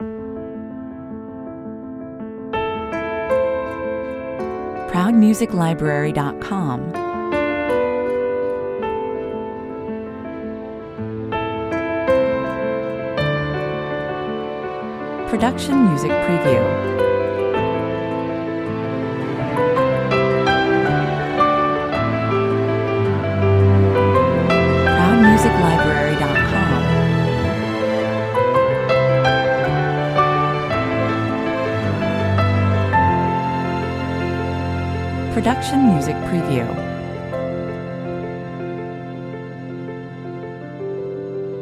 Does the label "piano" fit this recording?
Yes